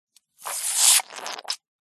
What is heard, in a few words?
foley liquid foamy bubbly squirt squelch at end